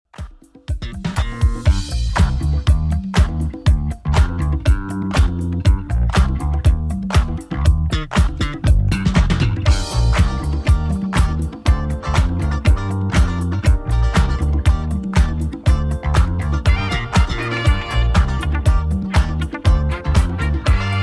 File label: 80s Redrum 120 bpm CLEAN